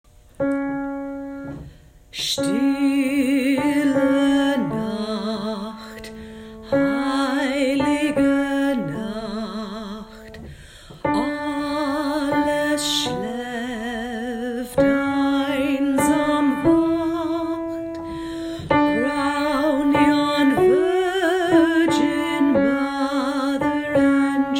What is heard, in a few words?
Silent night alto